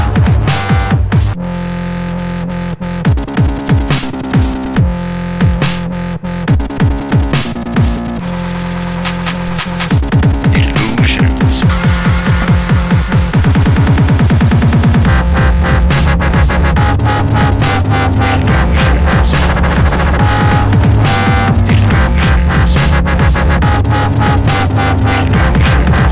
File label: illusions Dubstep